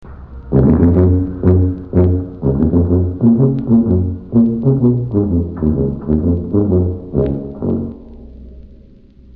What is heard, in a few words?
sousaphone, Brass instruments